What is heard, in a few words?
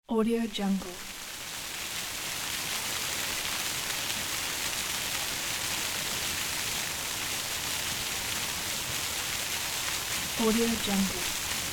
fountain; فواره یا آب نما; urban sounds